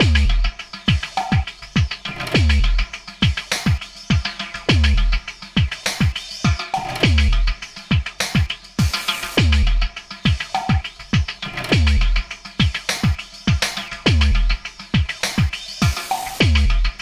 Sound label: Sky Lab Beat, Processed Drumkits